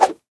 sword swoosh

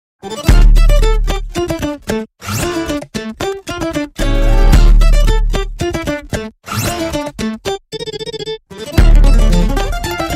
guitar, Voicemod; art of guitar

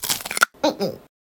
Eat Bad

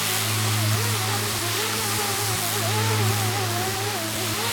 zipline powered

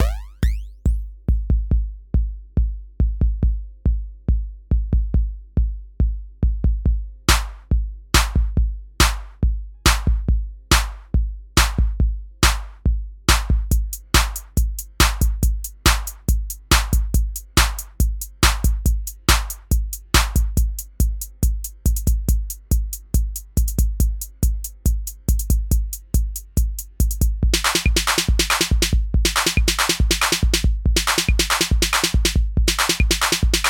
drums; Advertisement

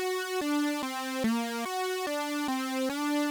wah wah wah wah

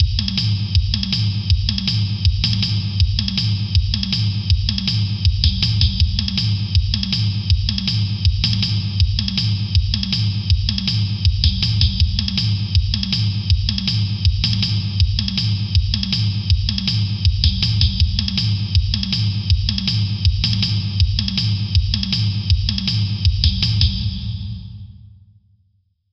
POST PUNK DRUMS

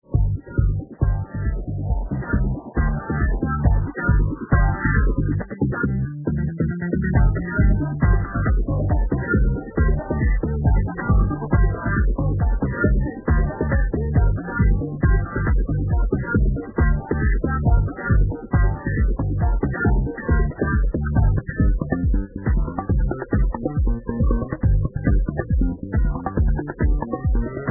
Disco Meow

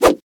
sword swoosh

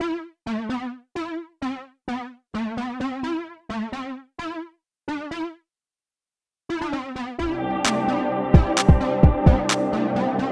Chill Beat

